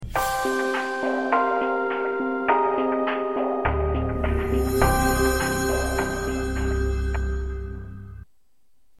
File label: Moonlight Ringtone